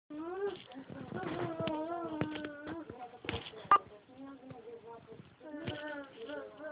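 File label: baby hungry, female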